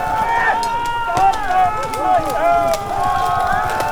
BATTLE Medieval Reinact Footsoldiers Knights loop stereo